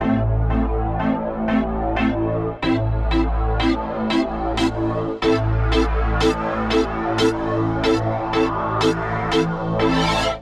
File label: Pad (Am) 92bpm; Music Loops; Pandora; Ableton; Singularities